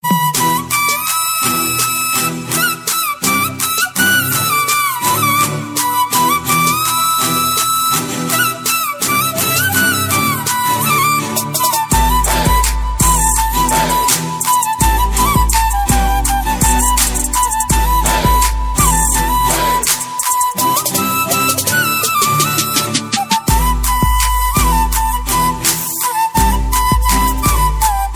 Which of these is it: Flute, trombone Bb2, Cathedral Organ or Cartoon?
Flute